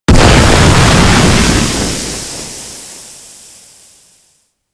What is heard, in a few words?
Flashbang B; Grineer Death Squad